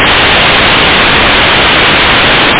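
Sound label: hi hat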